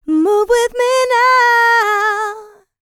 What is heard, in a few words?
LICKS, DANCE; R&B & Dance; ILIO; Vocal Planet